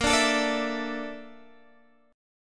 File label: Bb Major 7th Flat 5th, Guitar, Open G